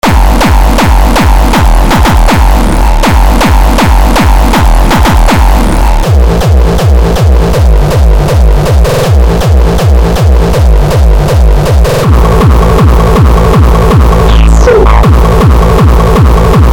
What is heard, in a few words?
hardcore kicks hardstyl; hardcore kicks vsti